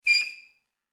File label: Footsteps Squeak Shoes On Floor; Human